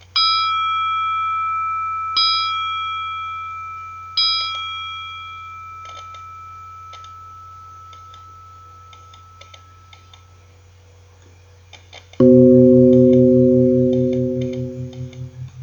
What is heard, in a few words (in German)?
Arm Glocke